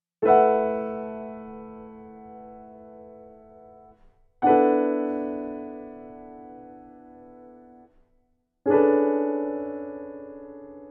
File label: chords sho